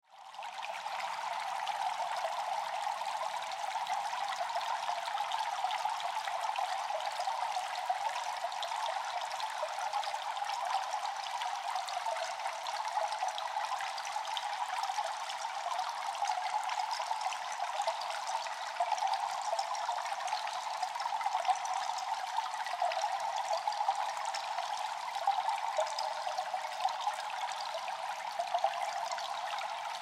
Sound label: Water Cave Ambience Water Drips; Water (Sound Effects:Nature)